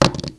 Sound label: Impact